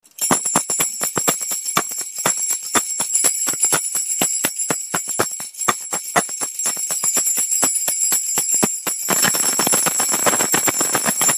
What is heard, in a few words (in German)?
Schellenstab